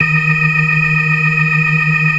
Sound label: FAST VIB, ORGAN, TREMOLO VIB